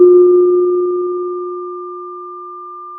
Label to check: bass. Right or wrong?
Wrong